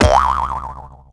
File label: Bounce variation